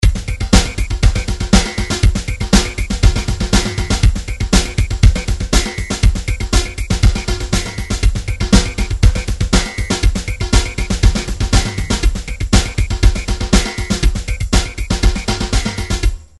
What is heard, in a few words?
drum grooves midi loops house; Nice Beats 1000